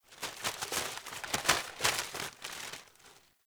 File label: Paper Blowing; Warframe; Ambience; Grineer; Carnival